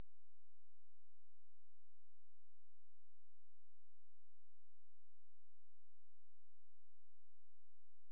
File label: HUNTING